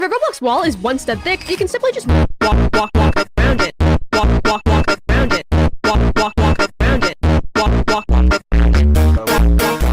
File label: phonk